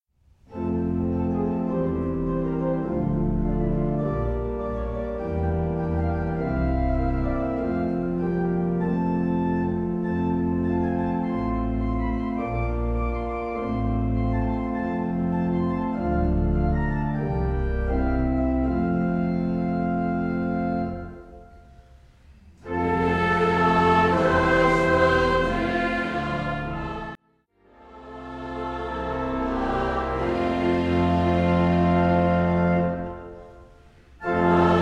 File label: Hymn